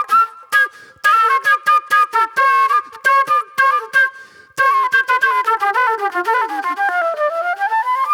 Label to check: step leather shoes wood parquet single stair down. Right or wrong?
Wrong